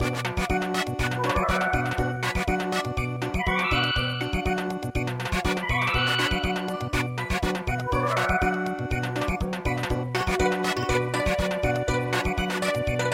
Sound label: Samba